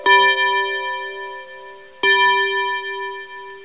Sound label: musical